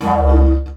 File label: Didgeridoo Kit Samples